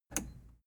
plastic button scratch push enable

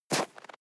step snow walk single

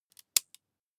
meter hit

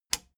plastic button latch loose push enable

